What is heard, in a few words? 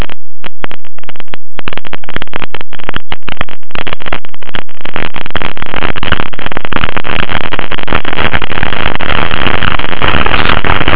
Static